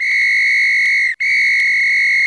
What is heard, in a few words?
whistle blow